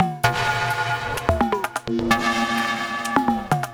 VFX2 Loop 128 BPM

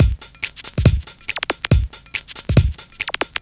Breakbeat 140 BPM

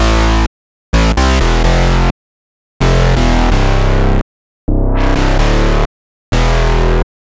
Query Bass Root A, 128BPM; Vengeance Samples; Query Kit

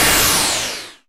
Downsweeps

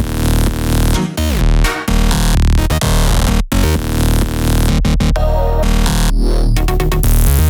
Dynamite Melody Root D#, 128BPM; Vengeance Samples; Dynamite Kit

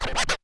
Cuts, Vinyl Record Samples

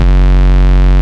Ultimate Bass Root F#; Vengeance Samples

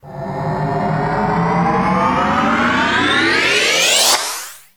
Uplifter

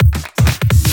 Fill Ins 128BPM; Vengeance Samples

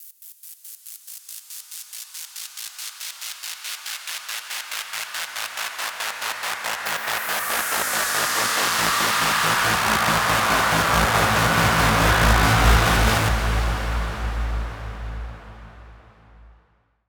140BPM 8Bars A, Melody Fills; Doctor; Vengeance Samples

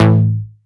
Synth Cuts; Vengeance Samples; VES1 Instruments

